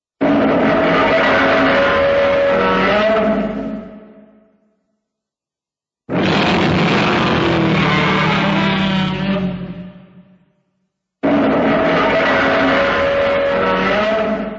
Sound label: tv gozira koe